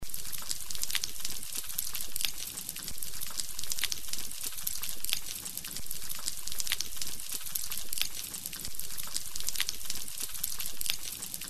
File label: snapping shrimp; Eavesdropping on snapping shrimp; Snap Chat